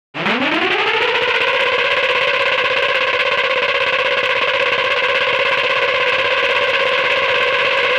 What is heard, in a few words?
bike